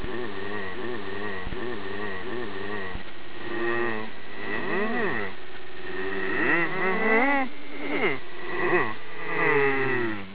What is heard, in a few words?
moo